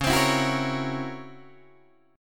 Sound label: Db Minor Major 13th Db Guitar DADGAD; DbmM13 strummed; JGuitar